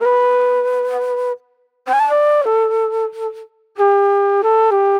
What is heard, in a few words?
Pop Flute; Sonic Foundry (Sony Creative Software); Manhattan Flutes; Slinky's Place